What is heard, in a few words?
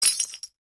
break